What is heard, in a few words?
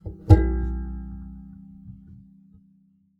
Classical Guitar Extended Technique